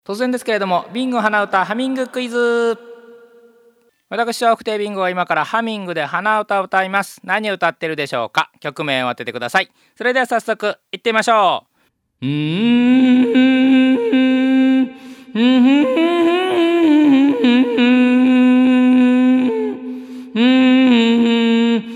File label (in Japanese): hanauta